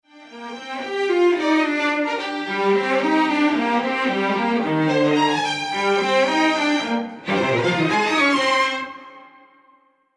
Imager Asymmetry L On

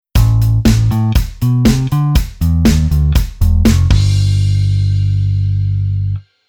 bass

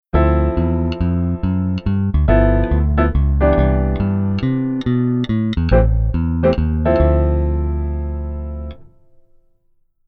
keyboard rhythm

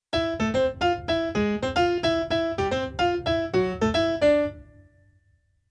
Keyboard Pf Latin Pf

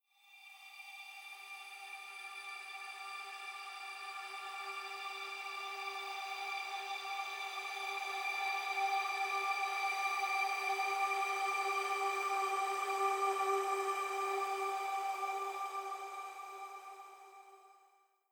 Future bass Chord Pad Hi

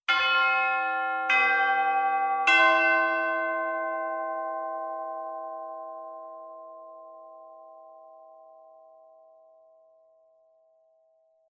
chime

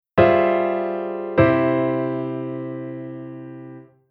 Chord V7 IVmM7onV I

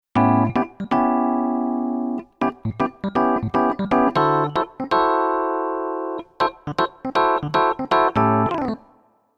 Keyboard Pf Funk Pf

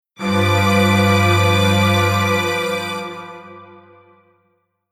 Strings Wasei

